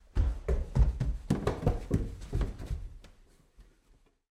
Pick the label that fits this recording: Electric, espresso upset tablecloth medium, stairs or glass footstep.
stairs